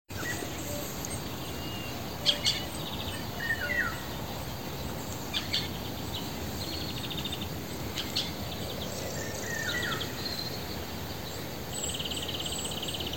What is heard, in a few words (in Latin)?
Chloris chloris; SETE